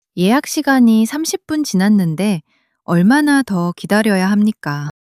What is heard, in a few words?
struggle